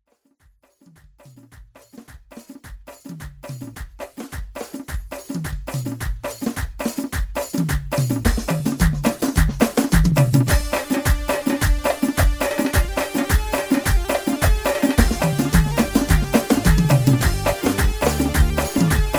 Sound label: khoram, rhythm